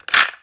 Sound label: Cassette Rattle